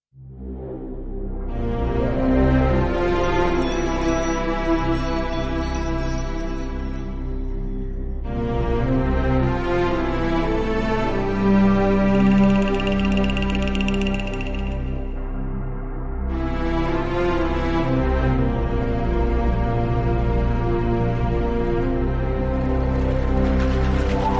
Loop; crime stories from the files of martin myst re

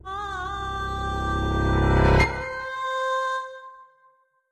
Level Up Stereo; Feudal Alloy

